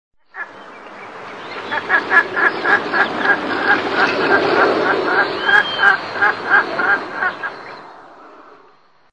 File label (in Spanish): voz maca pico grueso